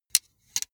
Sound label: sunglasses metal side piece fold short